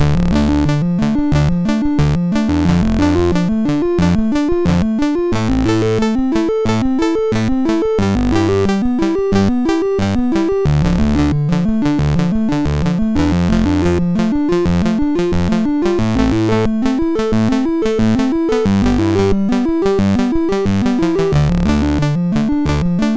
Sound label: sid MOS6581R2, GAMES; Fantasya